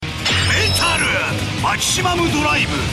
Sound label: Metal Maximum Drive